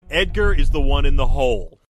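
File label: Is Calling R&B, ringtones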